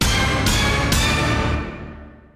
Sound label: freegames increment; Captain Shark; wazdan